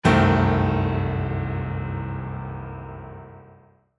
ガーン; 場面切り替えや心理描写; 演出効果音

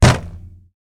テーブルを叩く

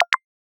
メッセージ

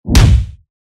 ハンマー 通常攻撃 モノラル; 武器効果音

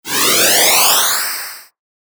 タメ モノラル

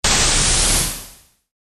ダッシュ

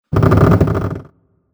扉を開ける 短め; モノラル; ＲＰＧゲームのダンジョンやフィールド

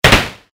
アサルトライフル 2発 モノラル; 武器効果音

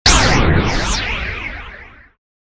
ビーム砲 モノラル

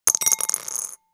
チンチロ サイコロを振る 小丼

